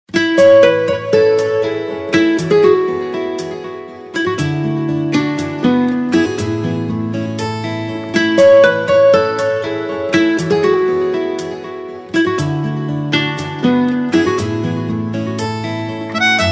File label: Theme Tone; Ek Hasina Thi; Ab Jaana Sanam